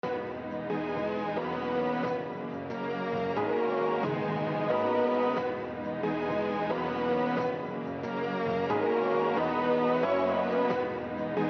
B major 90bpm

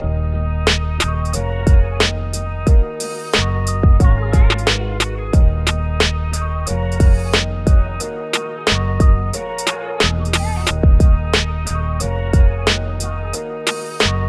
E♭ minor 90 bpm

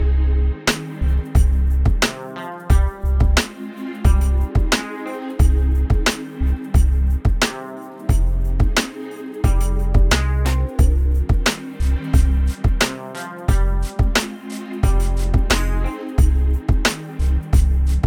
89bpm F Minor

